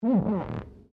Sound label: GLASS SQUEAK FINGER